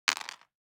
DICE on Cardboard, Throw and Roll, Mini, 3 Three Dice; Samples; ArticulatedSounds; DICE Sfx Party (Extended)